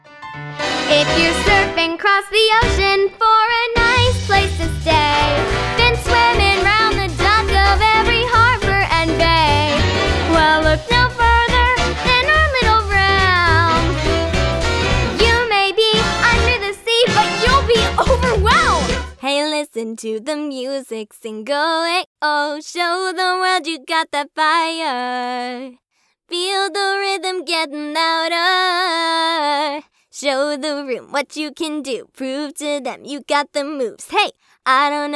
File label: SINGING